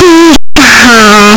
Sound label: pikachu cry; pokeyellow